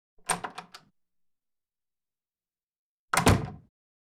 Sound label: Door, Wood OpenClose With Latch; Hollywood Edge; Door, Wood #1